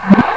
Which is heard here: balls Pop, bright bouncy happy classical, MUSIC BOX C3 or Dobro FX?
balls Pop